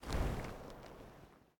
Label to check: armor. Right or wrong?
Right